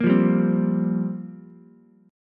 F Suspended 2nd Suspended 4th down Ukulele, Fsus2sus4 chord; strummed; JGuitar